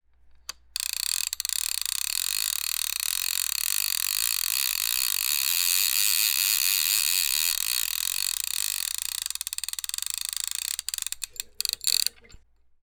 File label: clicks clanks